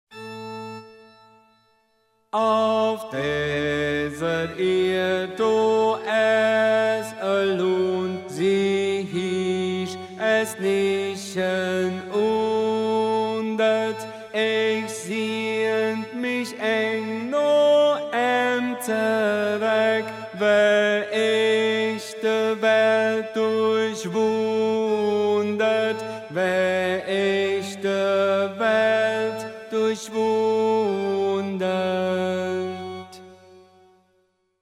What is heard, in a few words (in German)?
Tenor, chor